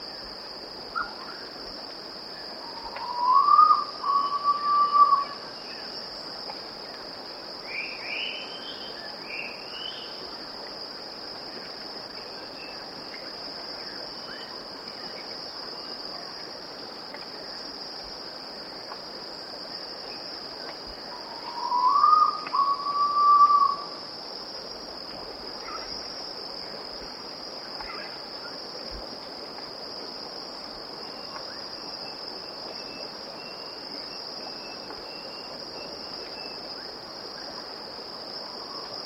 erythropitta erythrogaster, ptaki; kurtaczka czerwonobrzuchego

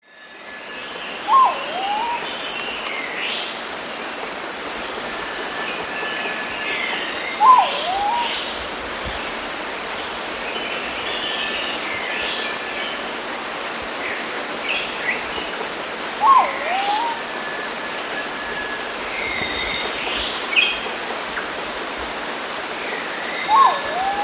calyptomena hosii, ptaki; nosoczub modrobrzuchy

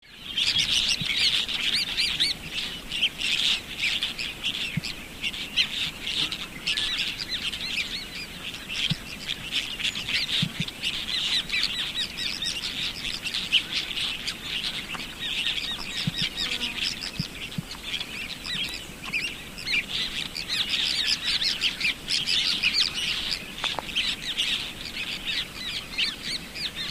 pseudochelidon eurystomina, ptaki; Jaskólnik czerwonooki; występuje w Afryce (Kongo i Gabon)

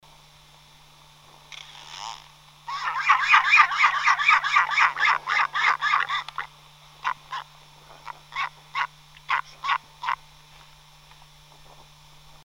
diomedea epomophora, ptaki; albatrosy królewskie

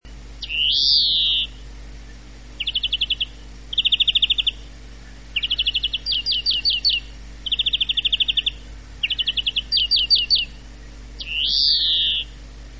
carduelis chloris; dzwoniec - śpiew

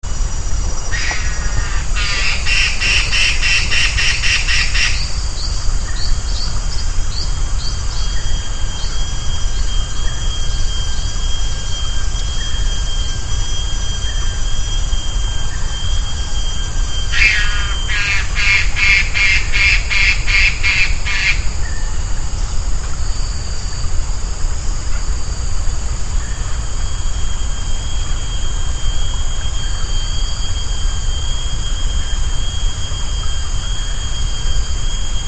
cymbirhynchus macrorhynchos, ptaki; pary szerokodziobów białoskrzydłych; Oto przykład głosu pary szerokodziobów białoskrzydłych; endemitem ograniczonym do południowych Filipin

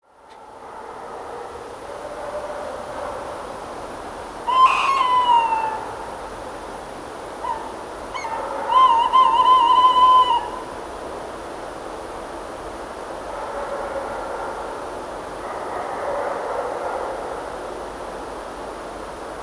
strix aluco

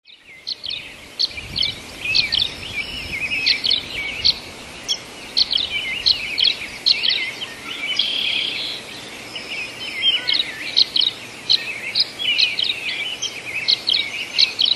tachycineta bicolor, ptaki; nadobniczka drzewna

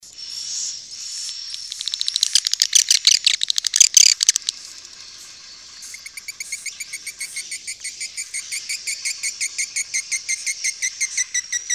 aerodramus fuciphagus, ptaki; salangany sundajskiej; głosu i klikania

